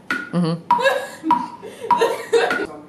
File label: uh huh laugh